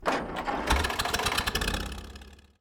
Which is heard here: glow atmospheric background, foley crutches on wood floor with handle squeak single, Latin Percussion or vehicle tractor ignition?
vehicle tractor ignition